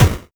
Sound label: Kick, 8-Bit; Games And 8-Bit Kit Volume2; atomsplitteraudio; chiptune